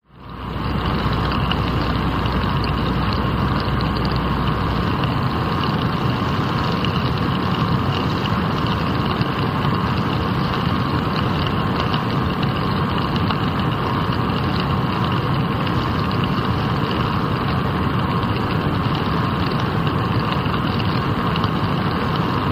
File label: Analog